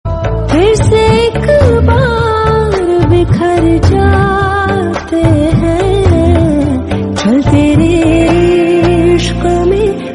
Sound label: Female Hindi Ringtones; Gadar 2